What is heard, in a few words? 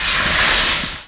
lava in; player; q2; baseq2